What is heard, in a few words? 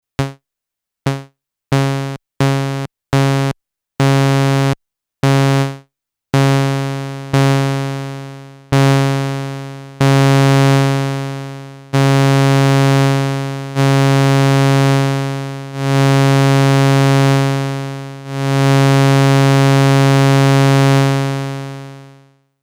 adsr, nanozwerg; MFB nanozwerg